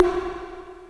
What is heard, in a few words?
IND; Zero G; Man Machine